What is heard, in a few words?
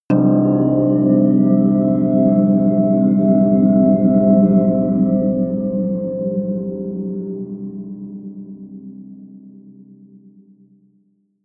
bell pad